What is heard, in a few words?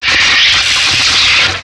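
Laser Fire Interior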